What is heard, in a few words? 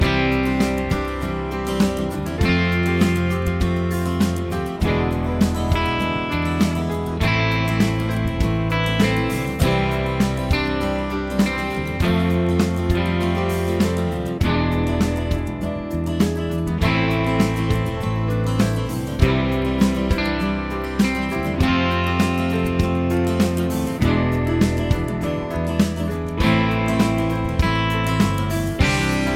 progs with applied chords